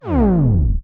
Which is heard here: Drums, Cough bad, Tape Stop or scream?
Tape Stop